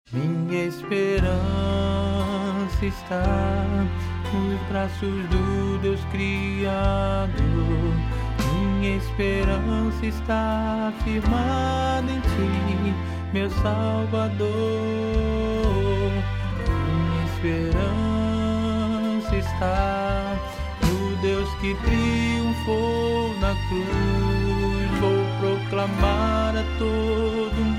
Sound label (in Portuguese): BARITONO